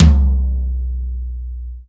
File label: TOM, Drum Dry; East Collexion; Drum 1